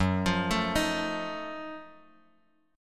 F sharp Minor Major 13th down, Bass Standard; arpeggiated

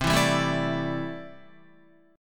C 6th Add 9th C down Guitar; C6add9 strummed

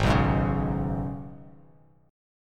Ab Minor Double Flat 5th down Bass Standard; Abmbb5; strummed

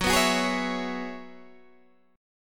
Gb 9th Suspended 4th Gb down Guitar Open D, chord

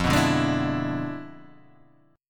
E 7th F, guitar; E7/F strummed; JGuitar

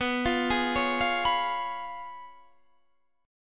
Db 7th Cb, guitar; Db7/Cb arpeggiated

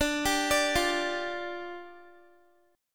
D Minor Double Flat 5th, down, Guitar; Dmbb5; arpeggiated

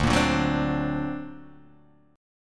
D Augmented 7th D down Guitar Open D

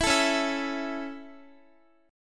C sharp Minor Sharp 5th down Guitar; C#m#5; strummed

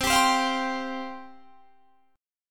F Suspended 2nd, C

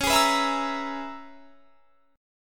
Db Minor 13th Db; strummed

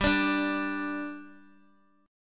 G 5th G Guitar, chord; G5 strummed

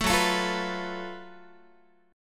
F sharp Minor Major 7th Double Flat 5th, Guitar; strummed; 12,14,12,15,15,x

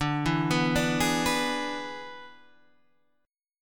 E 7th D, guitar

